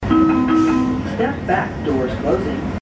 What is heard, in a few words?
doors closing; wmata